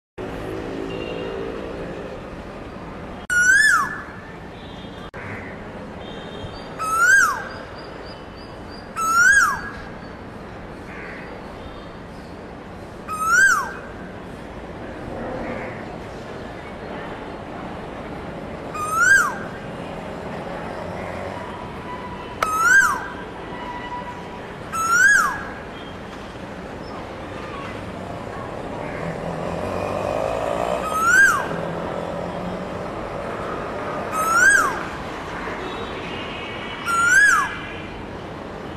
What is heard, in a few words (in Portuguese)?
canto do coel asiatico